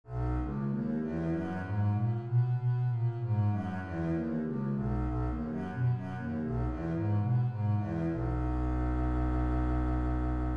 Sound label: bass b major, scales